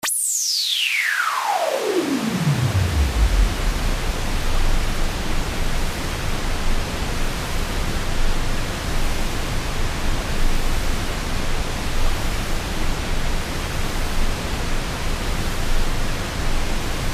hpf wave

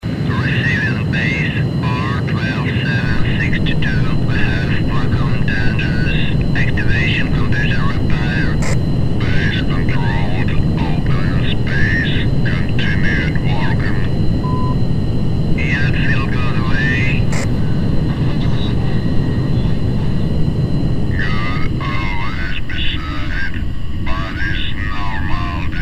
spaceport

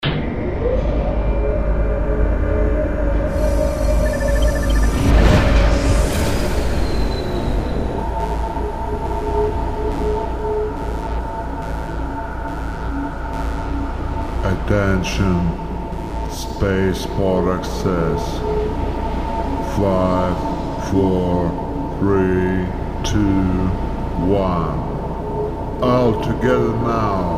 spaceport

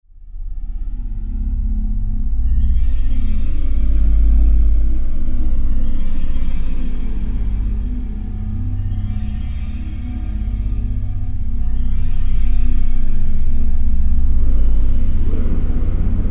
whisper

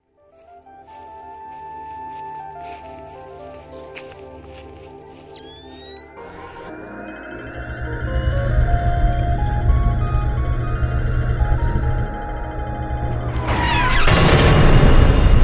fx entering

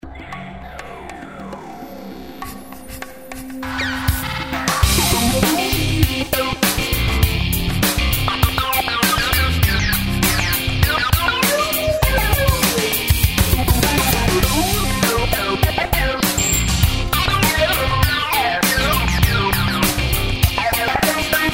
funkish

